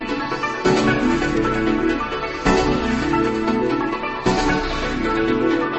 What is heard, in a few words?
melody